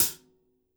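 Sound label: Jazz kit hi-hat, Drums Acoustic; AKAI; JAZZKIT 1; 90s sample CD; USB Soundscan vol.10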